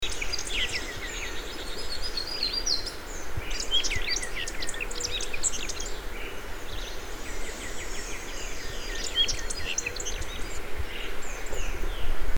Euphonia violacea

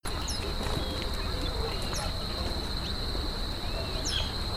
Blue tufted starthroat; پرنده اخترگلوی جقهآبی

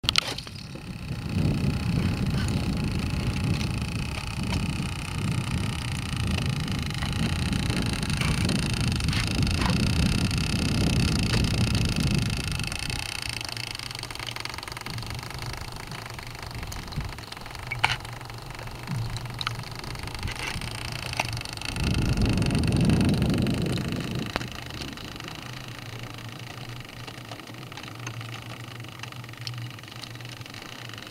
Polar ice, یخ های قطبی

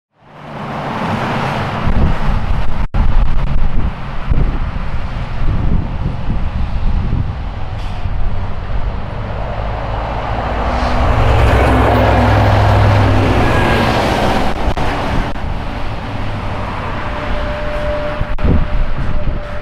Volvo FH16 550 Straight

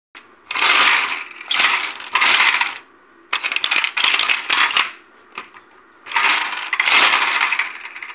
abacus; چرتکه

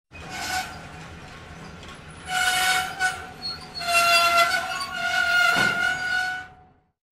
train brake; ترمز قطار

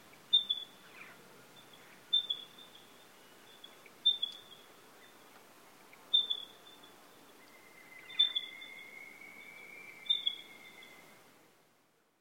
Pardalote, پرنده

